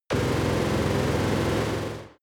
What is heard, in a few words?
far laser machine gun; krieg-waffen; soundpool